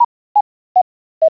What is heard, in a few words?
RADAR